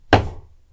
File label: nail gun